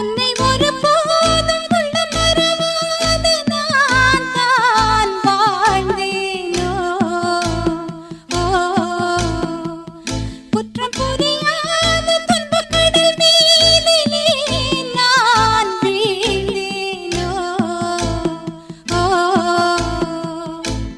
Female Ringtone

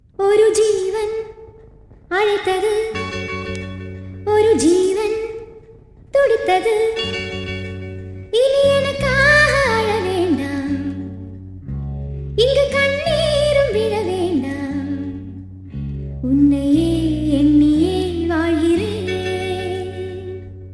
Female Ringtone

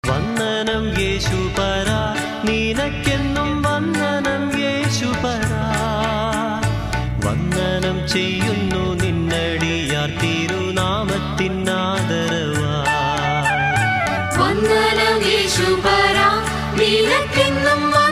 Old Malayalam Christian Ringtone

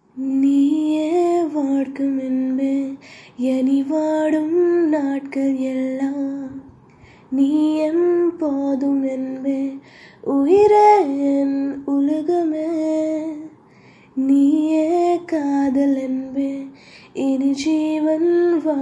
Female Ringtone

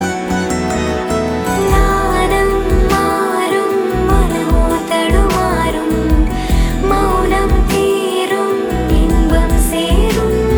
Female Ringtone

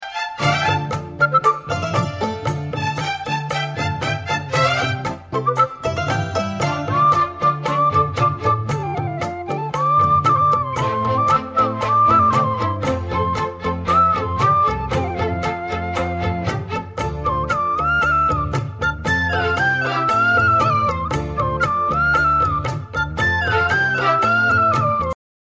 Flute Ringtone

